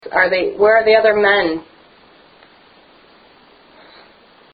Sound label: sigh; elsings 2nd hand shop; attic